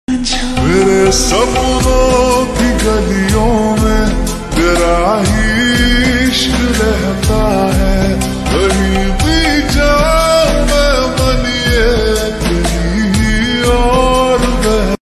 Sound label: slowed reverb song ringtone